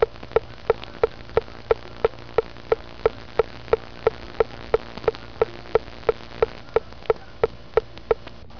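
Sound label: tick sound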